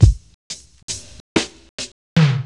Casio Rapman II

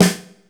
SN, Casio CTK-5000

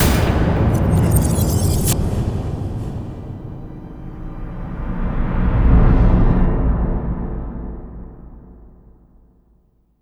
GLASS ATTA, HOTHEADS; Spectrasonic Distorted Reality 1

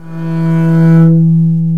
STR DB HRM, Chamber Harm; String Master Series; Combos 4

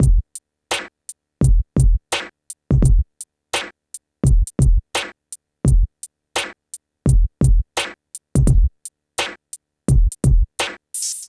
PHATT BEA

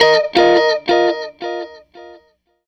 Strat Guitar Loop

